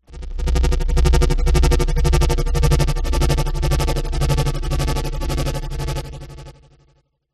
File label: sound design pulsating stutter mid low; zapsplat